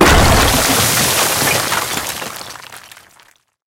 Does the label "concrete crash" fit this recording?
Yes